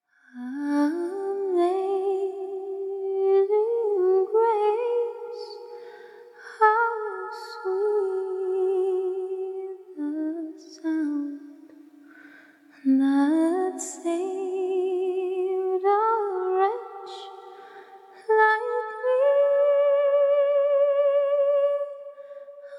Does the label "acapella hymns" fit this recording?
Yes